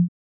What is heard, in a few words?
grunt